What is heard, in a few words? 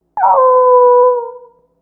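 animal wolf distant; amb elements; modern warfare 2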